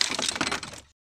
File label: falling, breaking falling hit